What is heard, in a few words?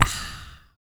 PERC, MALE PERC; Spectrasonics Vocal Planet; Jazz FX